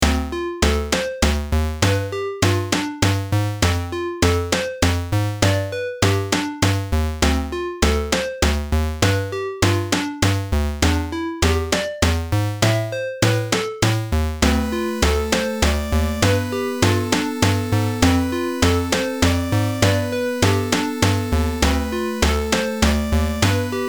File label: quirky waltz; miru far from home game concept audio